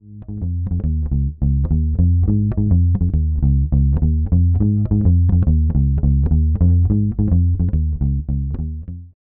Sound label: Fender Tonerhammer Drive EQ bass boost high mid cut treble cut